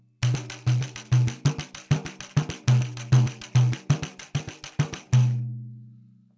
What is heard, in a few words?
Caixa, pandeiro